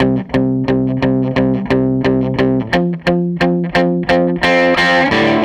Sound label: Tele Guitar Loop; Sonic Foundry; Six-String Orchestra